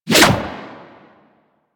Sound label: Prime Ankyros Whoosh D; Tenno